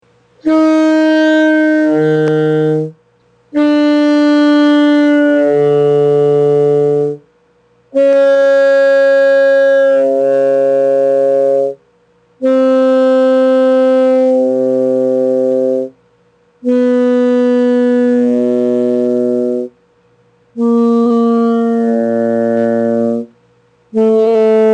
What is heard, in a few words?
octave drop downs, saxophone